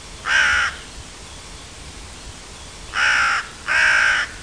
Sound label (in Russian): vorona corvus corone